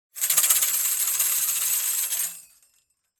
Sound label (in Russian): trjasut